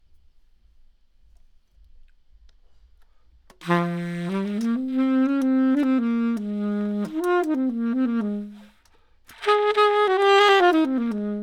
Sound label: SAX in Fmin